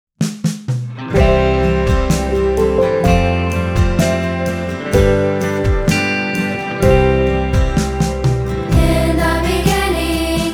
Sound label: band